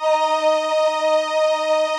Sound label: VP-330, String Machines